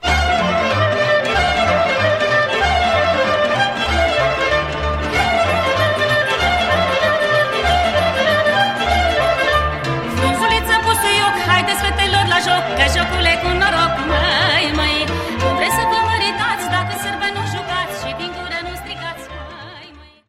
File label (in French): Sirba - Roumanie